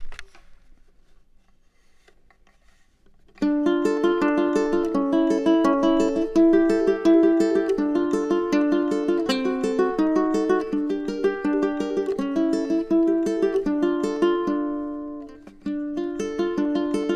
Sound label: etude in a minor; dionisio aguado